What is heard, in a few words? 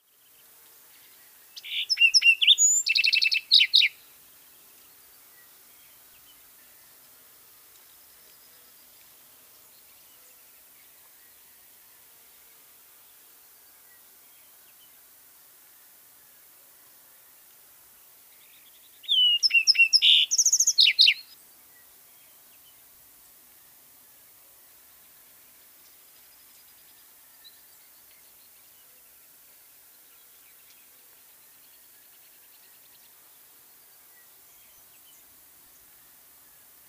Lark Sparrow Chondestes grammacus; sparrows